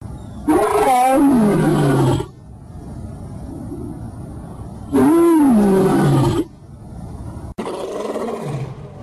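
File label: Lion roaring sound; TamilNadu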